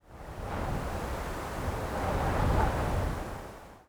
wind gust; ambient

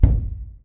drywall impact soft; physics; plaster

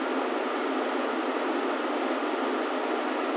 industrial loop, ambient tones

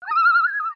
loon; Counter Strike Model Pack; CS:GO

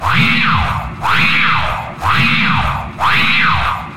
combine alarm; ambient; alarms

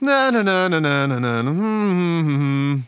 taunt song; Counter Strike; CS:GO

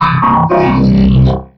vox e dry, strider; creatures; Half Life Alyx

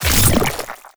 battery out, headcrab reviver; creatures

